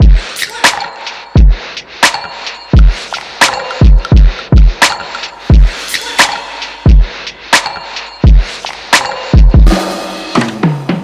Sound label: Drum Loop; Cymatics; Doozy